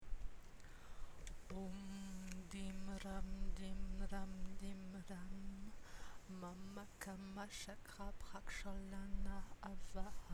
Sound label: Mantra; soins